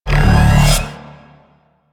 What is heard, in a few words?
Mech Sword Slam Charge; Warframe; Vehicles; NechroMech; Abilities